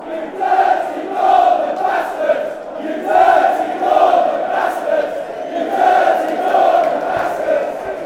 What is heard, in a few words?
dirty northerners reading, fan chants